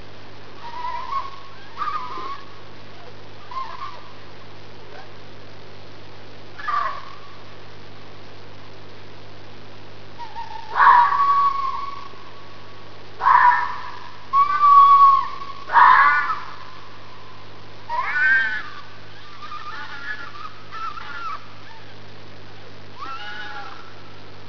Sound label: fox on a praire